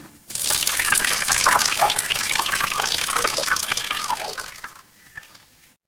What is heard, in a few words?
water pour